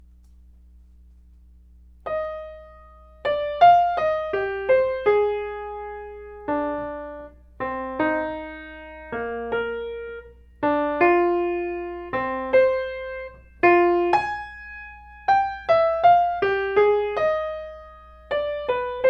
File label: Clarinet; Brahms; ear training level 3